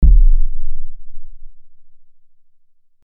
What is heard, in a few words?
Aliens Monsters; FXHome